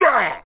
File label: pain; bruenor